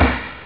wood; OctaMED SoundStudio V1

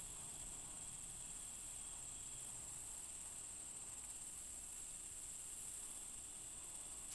night; TeamAlligator

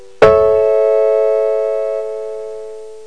piano, notes; ClickArt 300,000

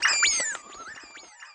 zebra finch

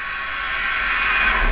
reverb, drums cymbals; OctaMED SoundStudio V1

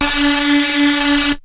big buzz, synths dancerap; The Sample Bank

